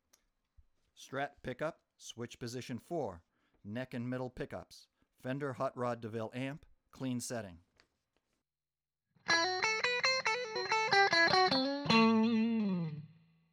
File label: strat neck mid hotrod clean